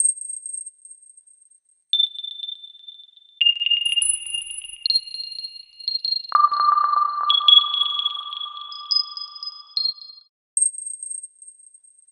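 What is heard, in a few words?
Drum loop; Capricorn Palace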